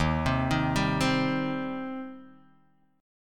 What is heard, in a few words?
Eb 6th Eb down Guitar Drop D